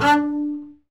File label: SPICCATO, ARCO BASS; Northstar - New Gold VOL-2